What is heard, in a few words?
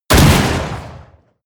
blast, hits explosionen metall